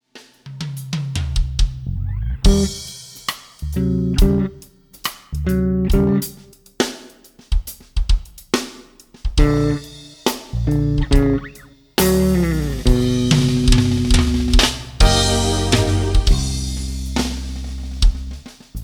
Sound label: Blues